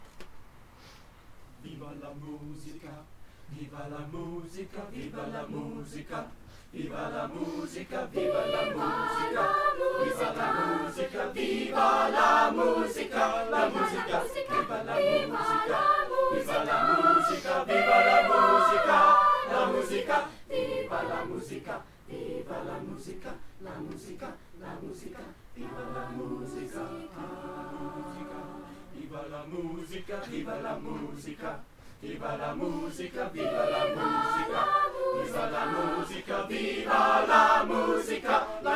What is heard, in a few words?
Chorus; Viva la Musica; Ivan Erod